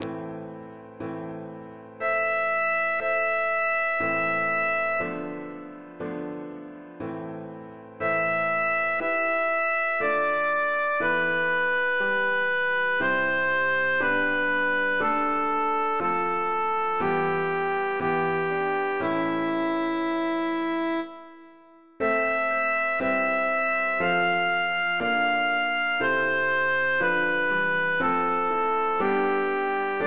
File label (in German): sopran I